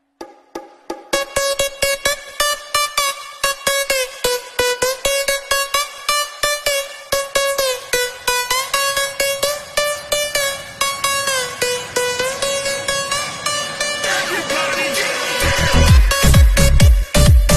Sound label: club mix ringtone